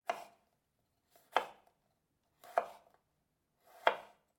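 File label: knife cutting, interior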